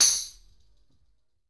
Tambourine Hit; Essential Percussion

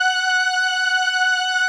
505, String Machines

